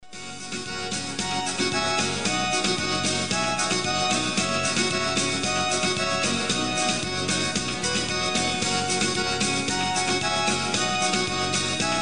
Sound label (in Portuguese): jingle; Roxafé; última gravação